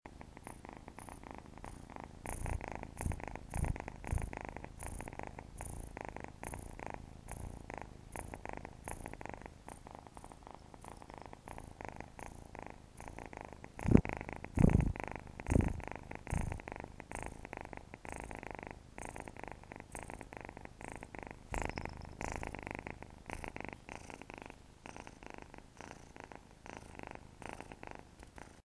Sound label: a happy kitten